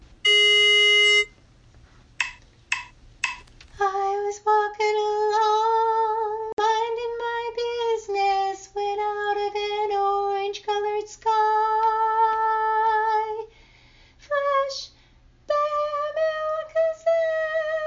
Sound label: tenor